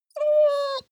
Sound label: dog whine trill; natur-tiere; hunde